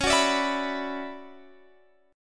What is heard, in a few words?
D Major 13th C sharp, chord